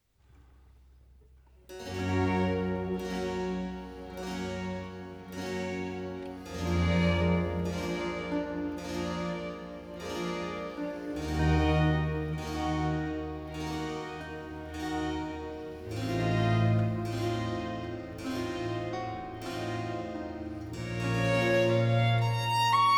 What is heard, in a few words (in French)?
Concerto n 7 en ut majeur op. 4 Largo, Ensemble Baroque